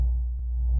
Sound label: rock toss cast; action RPG game; Adventures in Lestoria; Sig Productions